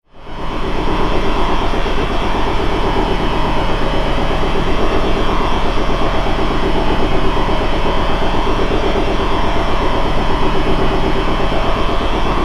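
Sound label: Steady Big Machine Machine, industrial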